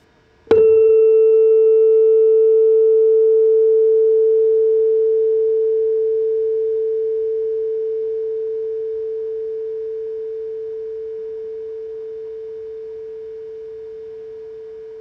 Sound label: tuning fork 440